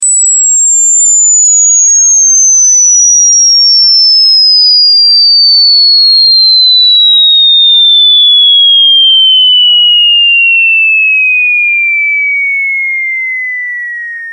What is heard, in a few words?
20 Khz Drop